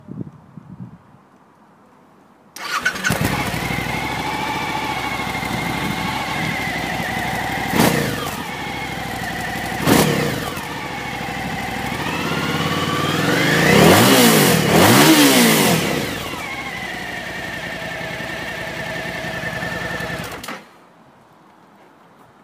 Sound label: V-Strom